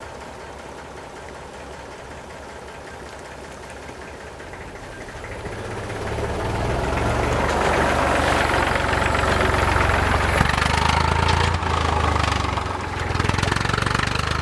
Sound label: Tractor Pass By Rev